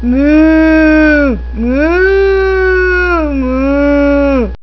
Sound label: moo